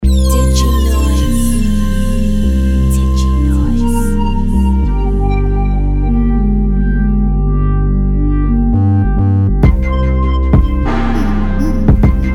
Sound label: Sylenth Future Rnb; Diginoiz; Sylenth Animals 2